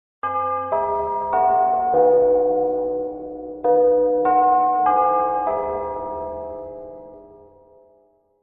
The Chimes Of 6AM; treasure island 2023

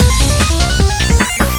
game music normal to fast

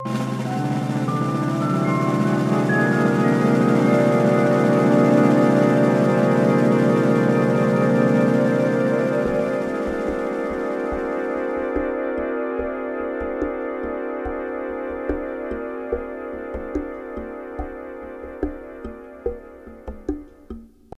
magician performance music; The Sims 3; windows gamerip 2009

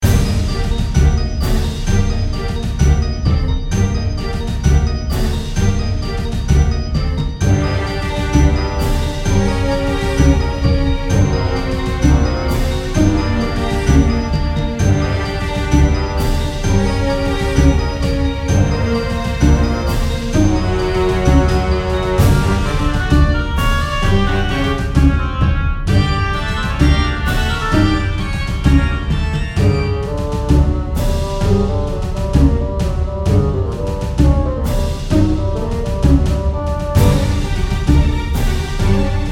Spooky Lands; Castle of Pixel Skulls

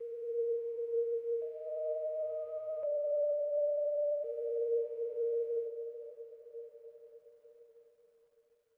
Overlay Tone; Flytrap

